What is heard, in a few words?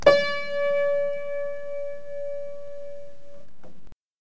Piano